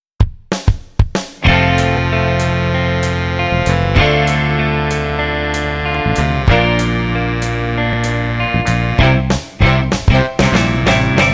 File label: Cute Carhop 70's TV Theme Sound alike